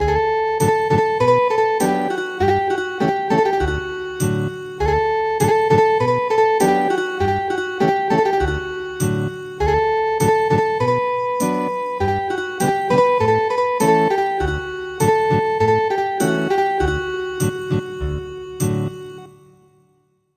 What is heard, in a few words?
Ethnic Guitar; Many Years